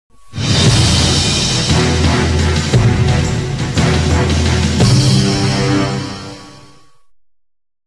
jingle; abc; news now

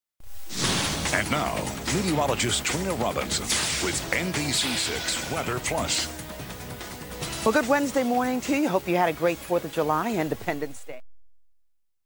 jingle weather plus; nbc6

